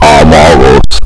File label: amogus sound; SussyCraft; amogus general